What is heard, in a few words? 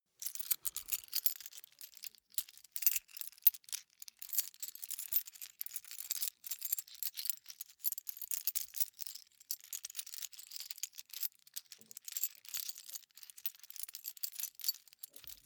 key ring large rattle sequence soft; objekte; schluessel